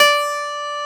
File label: Piano Ab2